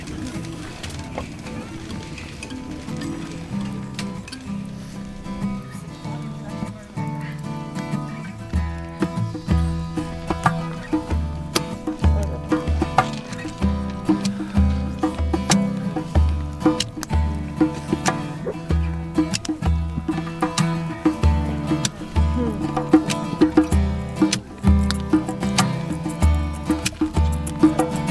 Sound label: sweet song n chant